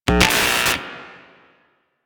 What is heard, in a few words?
Screen Static; Cephalon Tweacher; Warframe; Quests; The New War